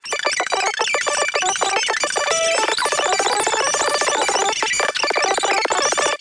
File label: mice squeaking song